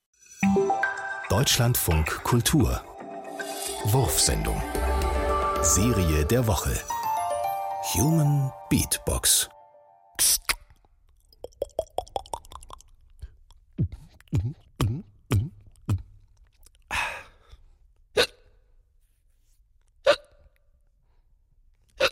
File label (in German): human beat box schluckauf beat; wurfsendung